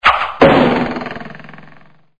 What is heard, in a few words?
Arrow Shoot Impact